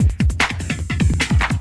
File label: kick beats